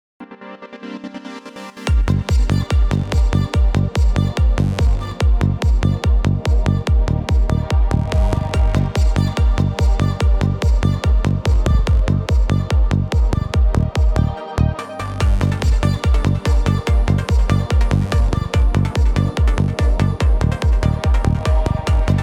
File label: Psytrance DRY